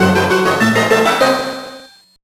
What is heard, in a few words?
Fanfare Alert; Sigma Star Saga; GBA